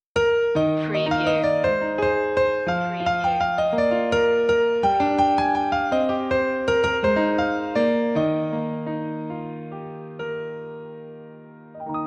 we wish you a merry christmas piano loop intro; media music now

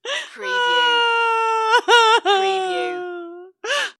Female Sob

